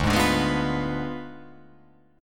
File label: D Diminished 7th D